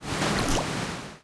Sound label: Water